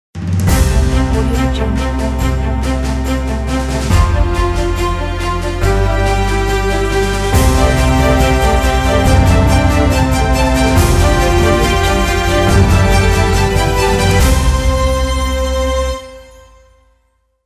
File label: epic cinematic logo opener